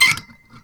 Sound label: valve